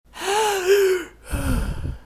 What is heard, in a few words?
cant breath